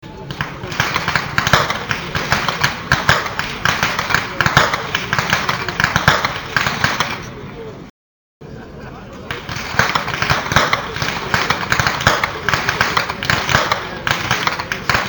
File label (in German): schnalzen, jugend; roth